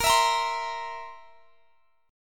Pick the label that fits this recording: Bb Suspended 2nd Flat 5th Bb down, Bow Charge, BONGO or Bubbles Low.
Bb Suspended 2nd Flat 5th Bb down